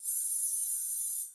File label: air hiss